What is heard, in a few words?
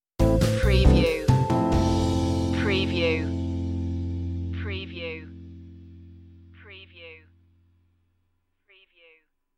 jazzy idents